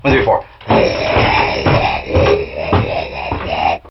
Stairgrind, Tracks Recorded With My Phone Around My Grandma's House; Some Subpar Scum Tracks